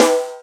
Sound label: Yamaha CS6